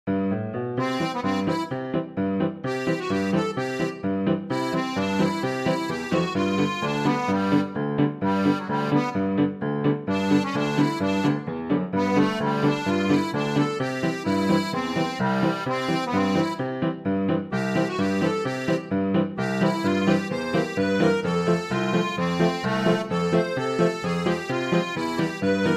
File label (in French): Polka du bebe phoque; Cirque